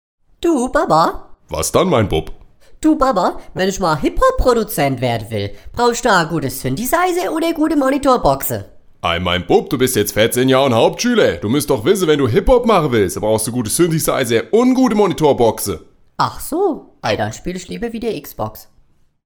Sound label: hiphop; hiphop-produzent